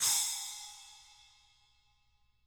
SPLK HIT, SPLASH CYMS

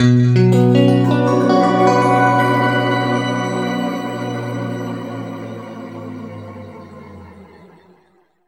B, guitar chords; East West; Steve Stevens Guitar Samples Collection

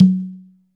RX CONGA LO; PERCUSSION; Back In Time Records - Big Bang

